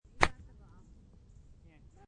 Golf Shot, High quality stereo recording of a golf shot; Golf (Sound Effects:Sports)